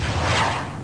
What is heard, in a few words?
missile fly; Chopper2K-v1.1C; Revista CD Expert 45